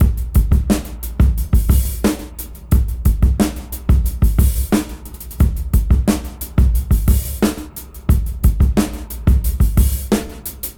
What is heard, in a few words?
Fat Break 89 BPM Rev; AMG - Keith LeBlanc Phat Butt Jams